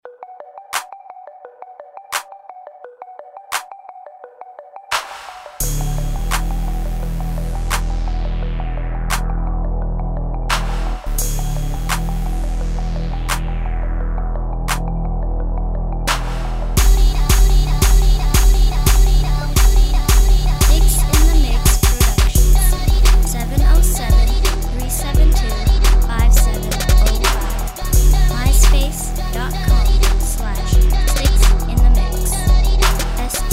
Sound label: doo doo dum, beat